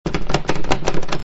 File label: door